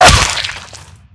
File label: killing sound